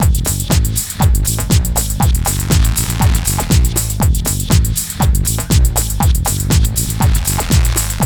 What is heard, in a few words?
GHET ROL; Best Service; Advanced Media Trax Building Blocks; GHET ROLL